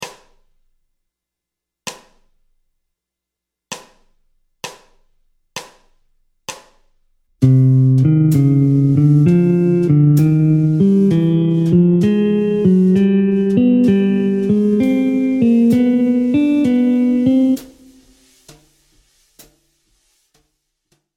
Gamme bop asc Pos 52 C min harm, guitare jazz; boper ses gammes position 52

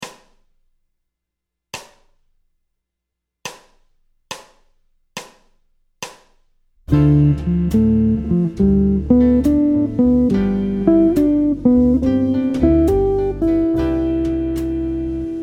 Phrase ii V7 I en C Maj, guitare jazz; motif jazz; triade up and down

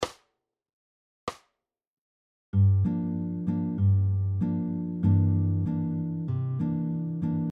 rythmique bossa, guitare jazz; bossa nova

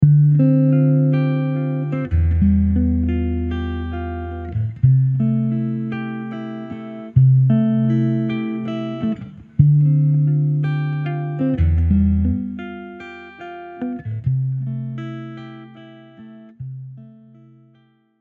prog ii v7 i, guitare jazz

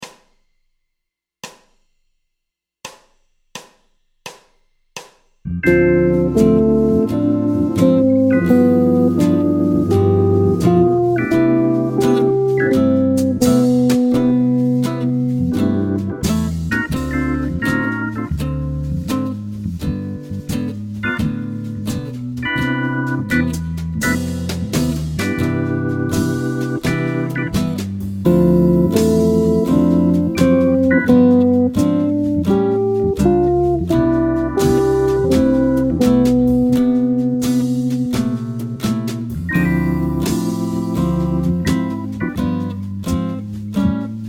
arp liaison Dm7 G7 C∆, guitare jazz; ii V7 I∆ majeur; transformations de l arpege de liaison

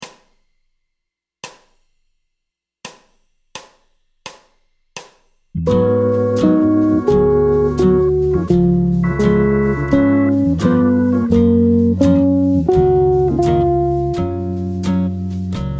arp liaison Abm7 Db7 Gb∆ aigu, guitare jazz; ii V7 I∆ majeur

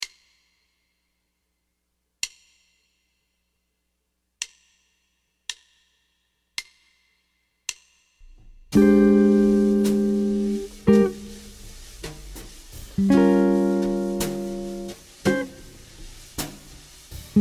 drop to blues rich, guitare jazz

